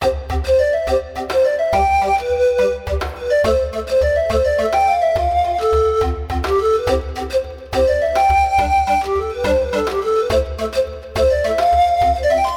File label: pan flute melody